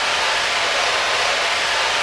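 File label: jump stream loop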